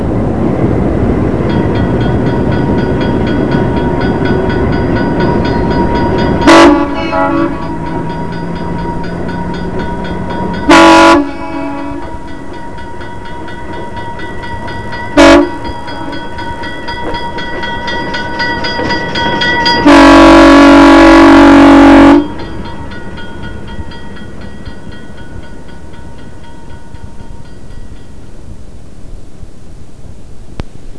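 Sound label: alco horn and bell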